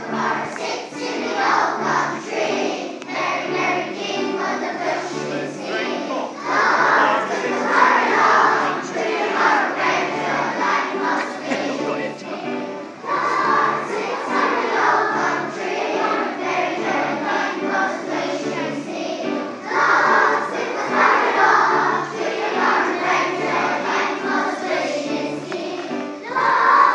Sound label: kookaburra infant music festival